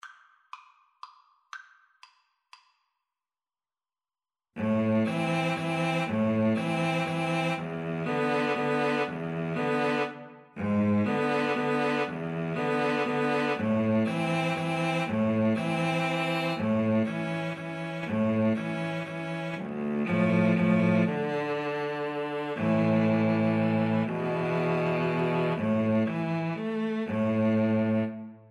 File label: la partida, Violin Cello Duet